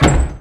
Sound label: Door close; 100rogues